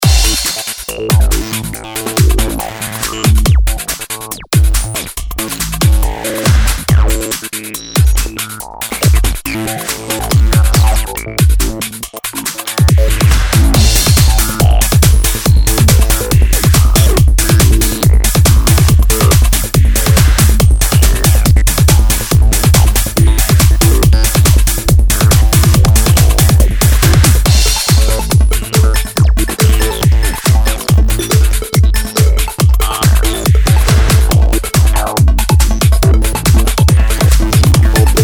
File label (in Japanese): カタコトテクノ